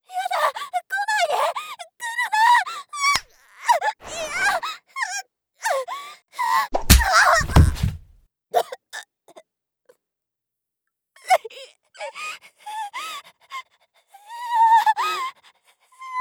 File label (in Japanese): 襲われる; 効果音あり